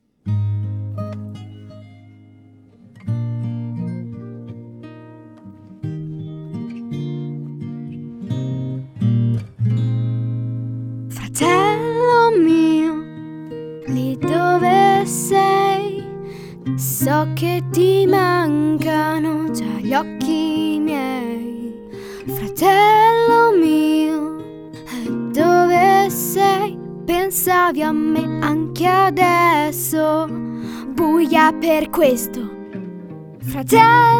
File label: singing sample